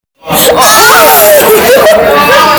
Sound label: ouuughh